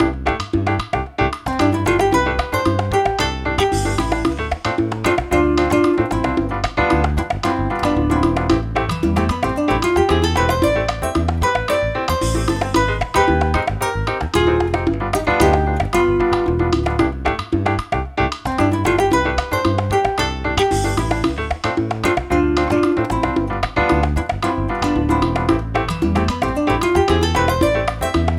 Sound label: Latin, alarms; Honor; Magic-7-RSR-Porsche-Design